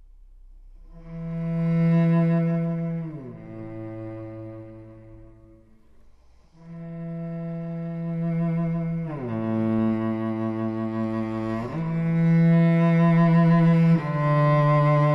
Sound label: VIOLONCELLO SOLO; SONATA II